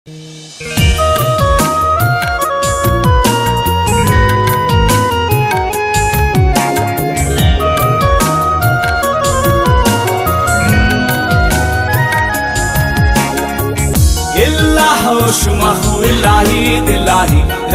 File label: odia caller ringtone